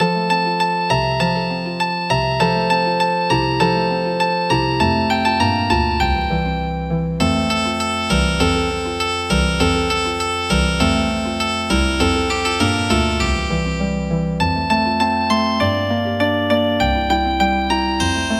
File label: Healin Harp